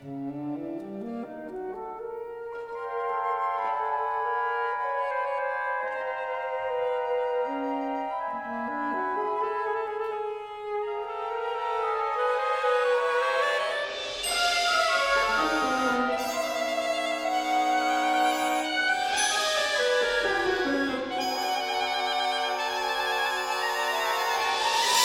Saxaphone Concerto Alto

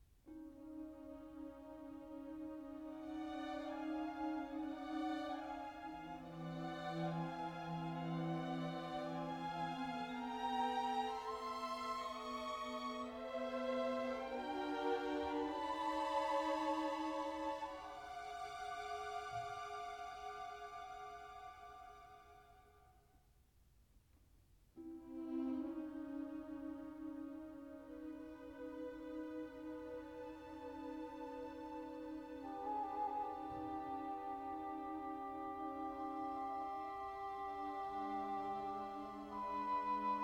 Orchestra Slow; Echoes Invisible World

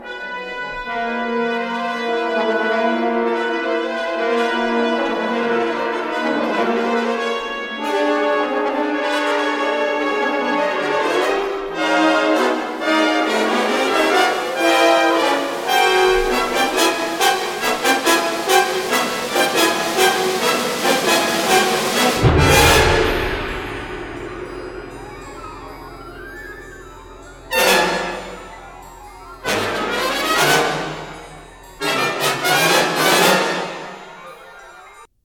Symphony Band; Sounds Shapes Symbols; 3rd Movement

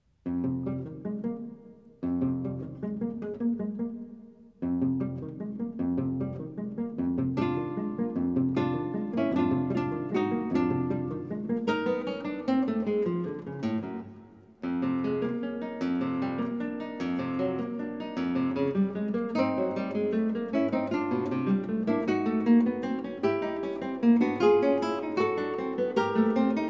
Guitar Quartet Finale

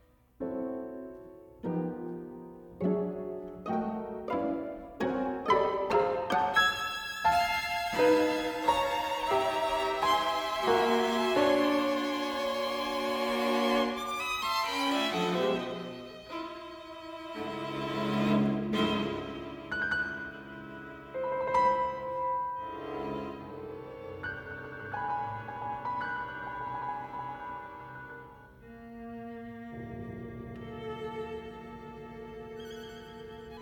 Piano Strings Sextet Fast

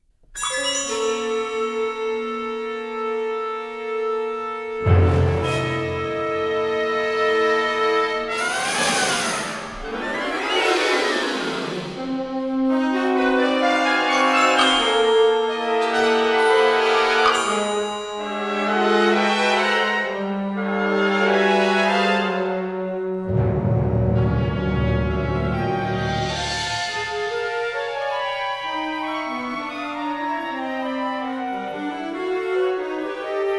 Symphony Band; Sounds Shapes Symbols; 4th Movement

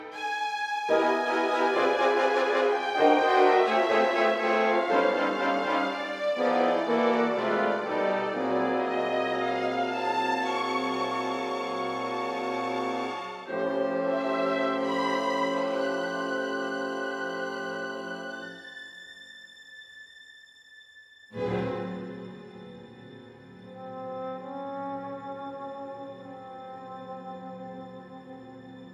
Orchestra Concerto Lirico Expressive

